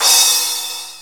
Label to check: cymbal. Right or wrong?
Right